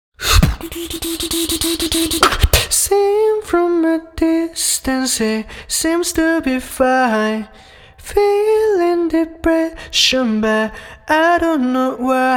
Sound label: Crying Inside, Beatbox Only